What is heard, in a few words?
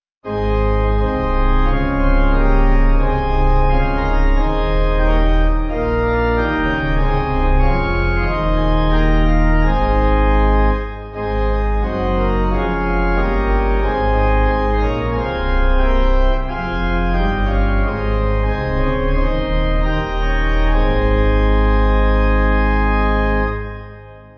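Pipe, Pipe Organ backing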